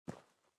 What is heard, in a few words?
SFX step rock; Out of Reach: Treasure Royale